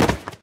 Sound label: tile fall; Golden Crayon Sounds